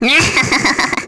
Vox Happy; kingsraid; voices heroes

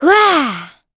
Vox Attack; kingsraid; en

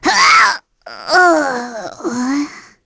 Vox Dead; kings raid; voices heroes en

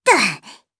Vox Landing, jp; Cecilia; kingsraid

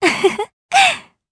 Laugh, jp; Laudia; kingsraid

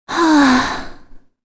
Sigh, kr; Kirze; Kings Raid

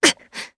Vox Damage jp; Tanya; kingsraid

